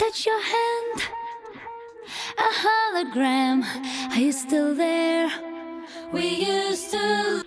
vocal loop, acapellas

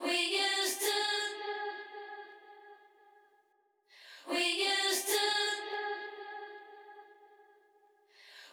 vocal loop, acapellas

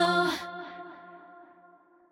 Vocal OneShot

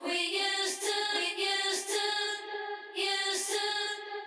vocal loop, acapellas

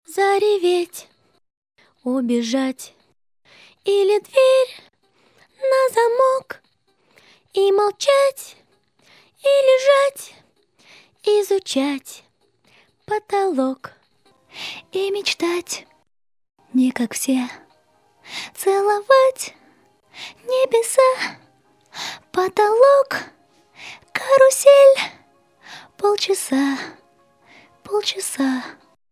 verse, acapellas

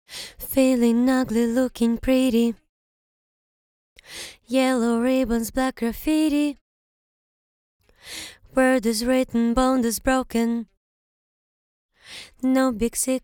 Verse Engl, acapellas

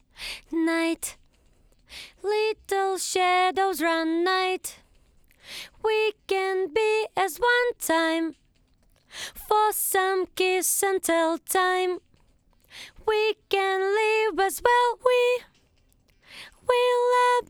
Engl Second Part Voice, acapellas

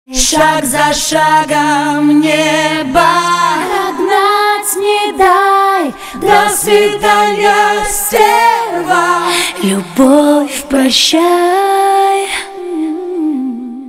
Short Acapella

